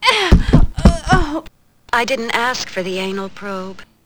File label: death; player